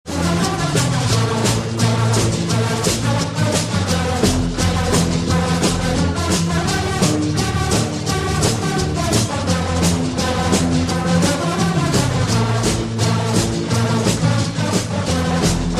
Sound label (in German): Banjo, klingelton